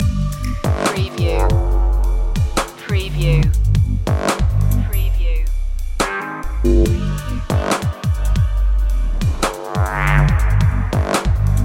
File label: stigmata loop